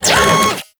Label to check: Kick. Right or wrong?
Wrong